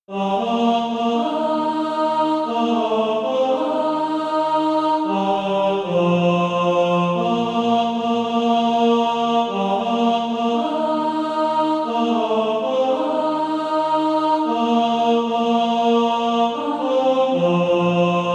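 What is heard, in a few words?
tenor, Practise Music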